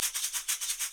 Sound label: TAMBORIM; E-MU Producer Series Vol. 5 – 3-D Audio Collection; 3D Percussives; 3D Ethnics